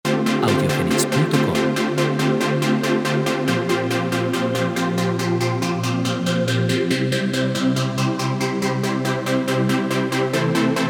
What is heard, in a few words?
Arp Clasic Saw; VITAL